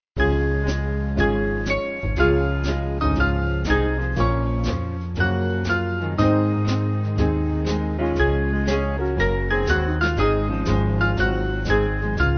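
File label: Band, Music Backing; O for a thousand tongues to sing; Lydia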